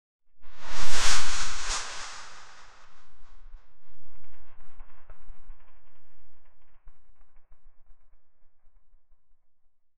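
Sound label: cape flutter leather flap; fabrics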